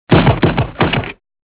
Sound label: Gun, Rifle